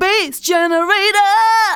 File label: vox, Techno Trance; Ueberschall